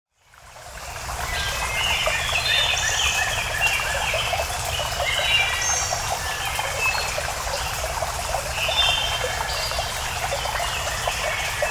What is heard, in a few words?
Woods; soundscapes